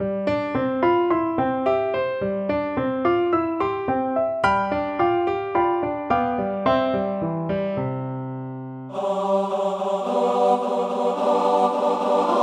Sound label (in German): gemischter Chor vierstimmig